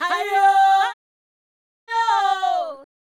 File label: Fem Vocal 128bpm G FX, African Voices; Quazibeat; African Secret; Female FX